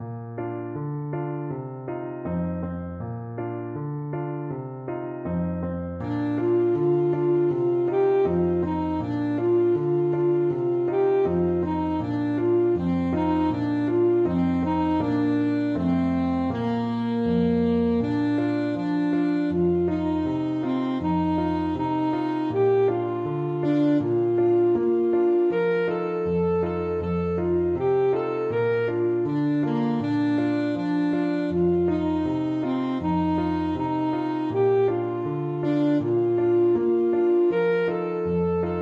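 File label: voice alto saxophone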